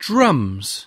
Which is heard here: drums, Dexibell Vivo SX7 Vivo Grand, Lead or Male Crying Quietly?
drums